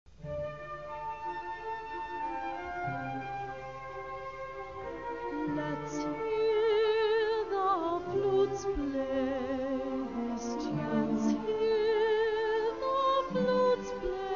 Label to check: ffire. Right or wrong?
Wrong